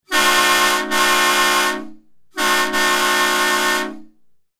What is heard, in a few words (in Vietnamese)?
Tieng coi tau hoa